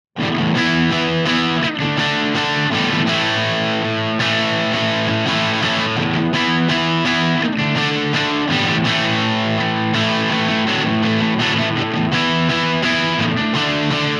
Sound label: crunch; jensentone